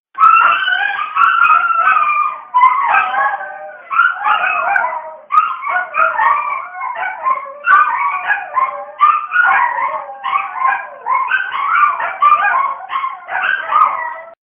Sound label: yorkie welcome tone